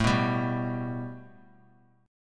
A 7th Suspended 2nd; A7sus2 strummed; JGuitar

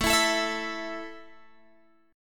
A 5th A down Guitar Open G

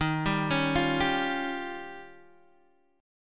D sharp 9th D sharp, Guitar Open D

